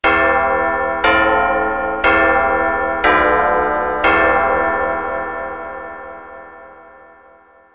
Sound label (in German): Kadenz; echo